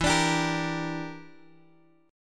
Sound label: E Minor 7th Flat 5th E, Guitar Open D